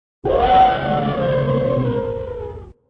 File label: A sound effect of an elephant call.; Elephants; Animals